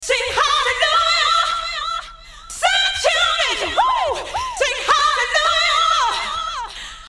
In The Morning Time A Cappella

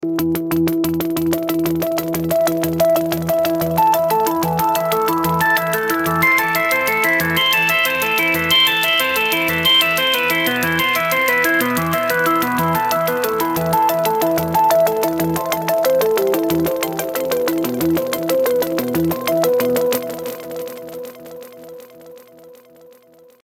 arp; rezo

